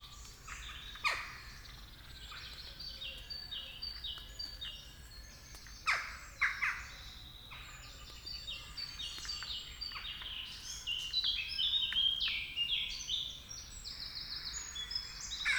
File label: Western Jackdaw Small Group At Potential Breeding Site